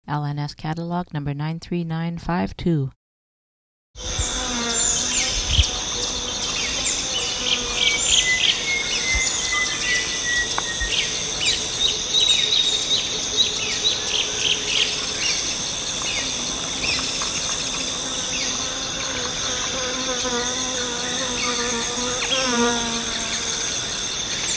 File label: chocolate backed kingfisher